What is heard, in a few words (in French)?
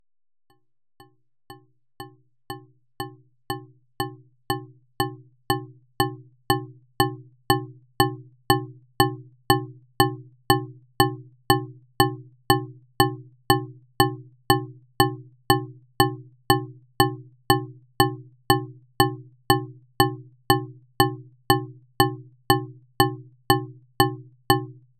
battement régulier qui accélère son de base; sons paradoxaux